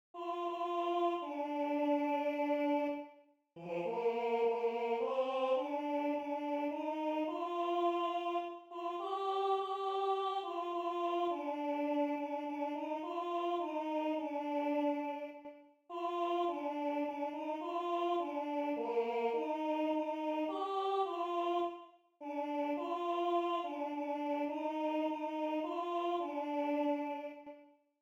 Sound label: Tenor I; Rorate